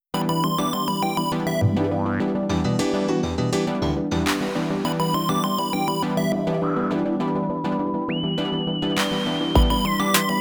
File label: Music Box; Waves Collection